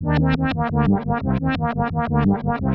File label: MUSICLP; neuro; Stanza